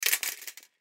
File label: Debris Drop Metal Surface Short; Materials; Foley; Sound Effects:Materials; SoundEffects-Blastwave